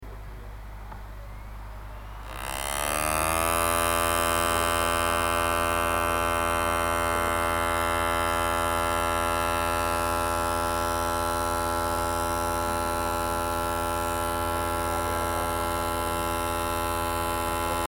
computer CD recorder badly fixed beginning to copy